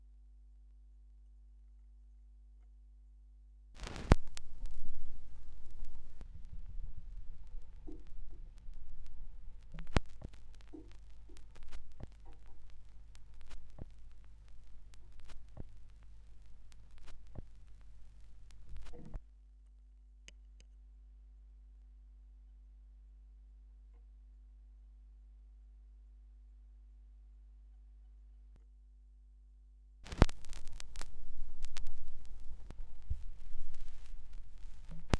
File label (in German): schallplattengeraeusche; rauschpartikel